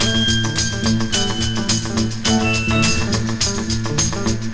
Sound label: keys, phonky loops